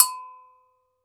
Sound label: GANKOKWE, African Percussion; E-MU Producer Series Vol. 7 – Old World Instruments